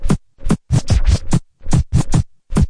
turntable scratch; Scratching and Turntablism; DRUM LOOPS and BREAKS

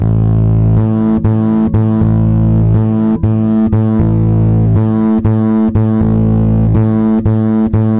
overloaded synthbass A, 120 bpm

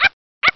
Arp Arp

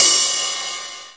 909 Ride; DRUM MACHINES; 909 Extended

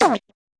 tight hit, Tight n Hard Kit; Hip Hop; STYLE KITS (Hip Hop, Etc)

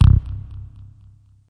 deep bass verb pluck; Basses

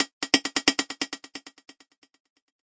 133 bpm, echo hats, drum loops and breaks

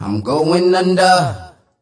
im going under, male singing

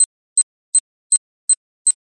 watch alarm; Electro and Synthetic

